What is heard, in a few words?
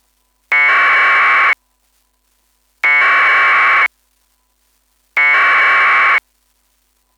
aprs pico beacon; raspi-pico-aprs-tnc